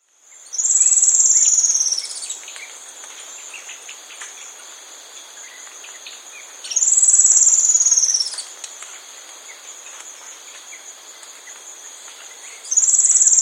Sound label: Brown-Bellied Antwren, Nature's Songs, S. America